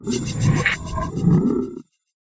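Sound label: necromancer stun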